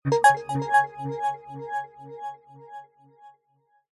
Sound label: multimedia game sound nature game generic